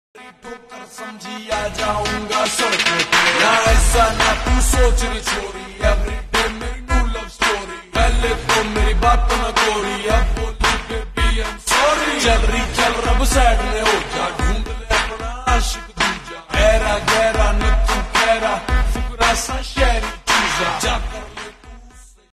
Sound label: lofi hindi ringtone